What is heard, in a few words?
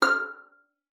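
violin pizzicato F6; Xsample VOL-5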